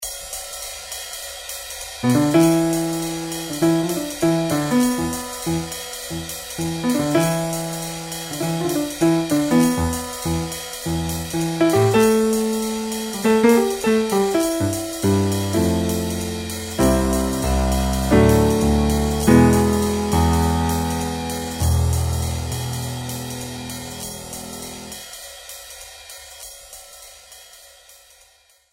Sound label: blues in e